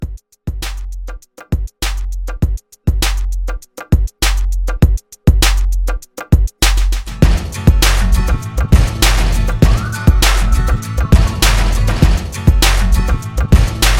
Uptempo Hybrid Re Drum 100

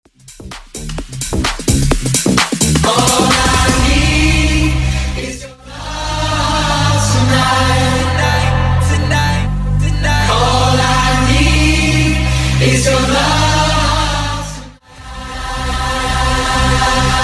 Word Play 129 BPM; Clean Edit

